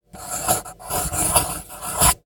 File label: pencil